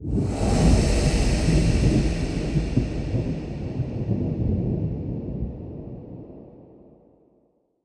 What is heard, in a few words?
Stinger; Warframe; Sacrifice; Sacrifice Vitruvian Sequence; Cinematics